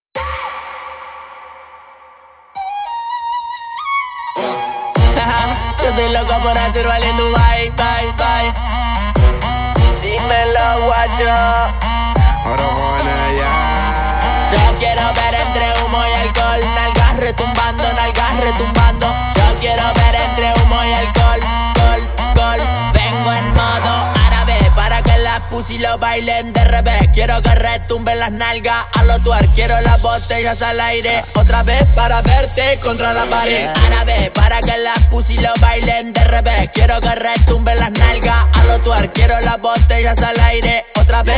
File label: musica; jail pyra